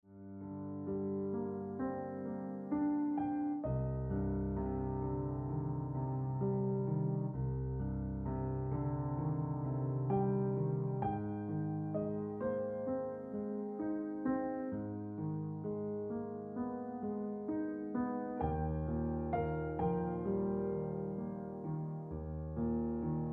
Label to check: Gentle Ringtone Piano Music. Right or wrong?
Right